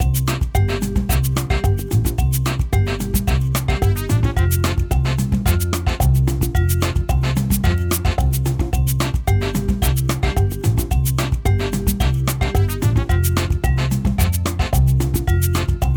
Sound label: Main Background Loop; Jackbox Games; The Jackbox Party Pack 1; FIBBAGE XL